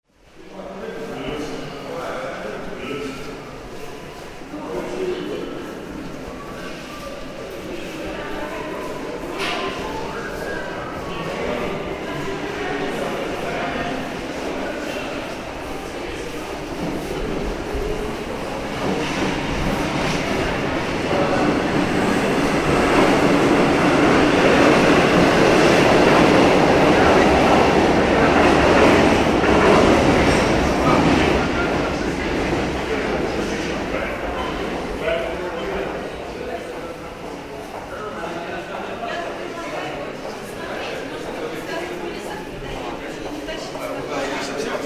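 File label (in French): capsule panasonic metro ambiance couloir; nagra; ares-m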